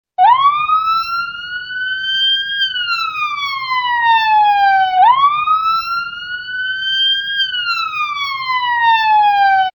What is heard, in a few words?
Police ambulanza sound effect